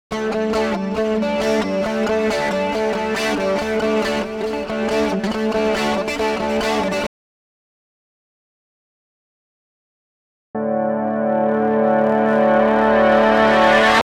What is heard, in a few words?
gtr boost